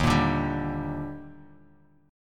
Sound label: Eb Major 7th; EbM7; strummed; JGuitar